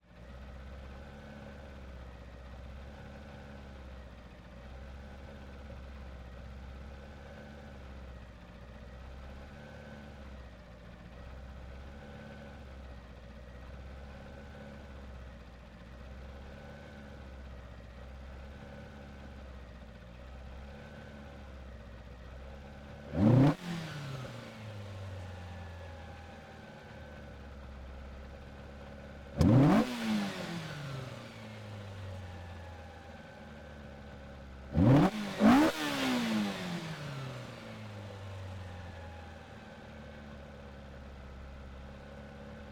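Ford Escort RS1800 MKII - onboard - IDLE - Steady Blips - ENGINE - Center; 1977; MKH8020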